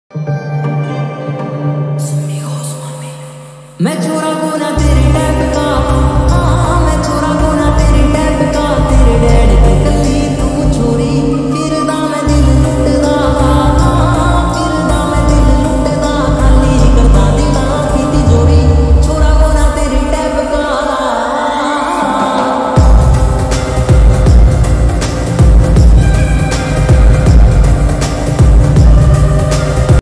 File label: lofi haryanvi ringtone